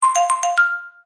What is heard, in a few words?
notification